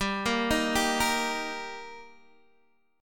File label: G Minor Sharp 5th G, Guitar, DADGAD; chordshape; down